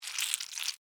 Spider Suck Blood, Animal, Spider, Suck, Blood, Arachnid, Insect, Bug